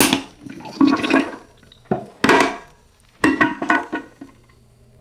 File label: heavy toilet seat dropping down; foley